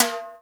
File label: Percussion, House